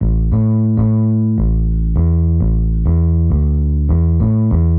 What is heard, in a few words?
X Bass, Rock Bass